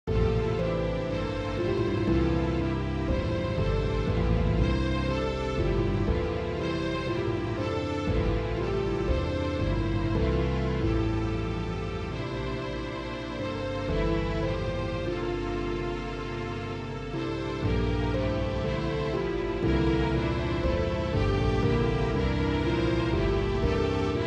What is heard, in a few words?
String